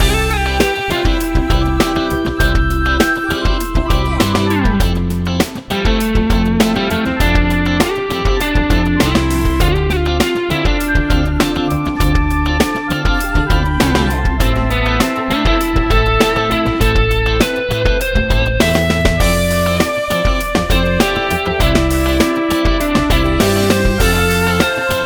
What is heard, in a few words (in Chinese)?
剧情背景音乐